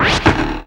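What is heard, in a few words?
REVERSE TWANG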